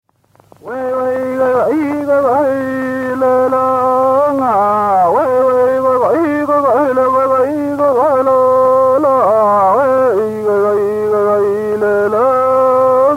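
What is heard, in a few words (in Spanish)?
Canto de mujer, Mapuche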